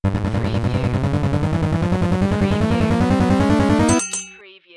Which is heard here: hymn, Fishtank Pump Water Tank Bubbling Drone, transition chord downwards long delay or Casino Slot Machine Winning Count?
Casino Slot Machine Winning Count